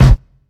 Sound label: kick single hit c key